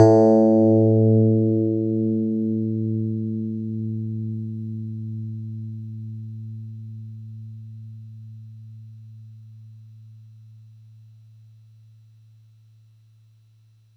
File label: FM Tine a2, Yamaha YX81Z